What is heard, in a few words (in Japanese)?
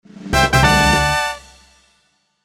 ファンファーレ; 動畫和制作視頻的音效